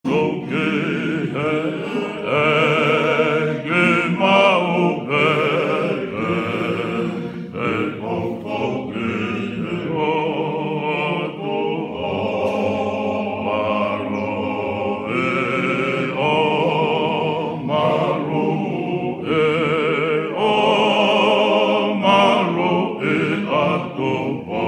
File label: Tongan hymn